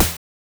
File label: Snare, Genesis; ToeJam & Earl 2